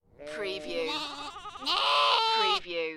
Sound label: Animal Sheep Lamb and Adult